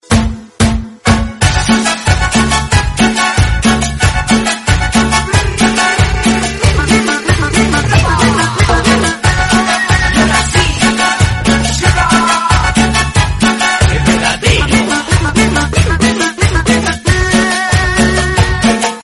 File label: cumbia